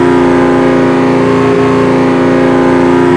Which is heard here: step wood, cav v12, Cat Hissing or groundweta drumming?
cav v12